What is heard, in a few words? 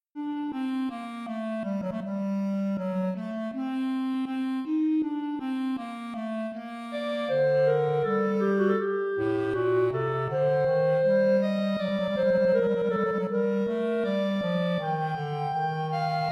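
duetto clarinet bass clarinet andante; wq 140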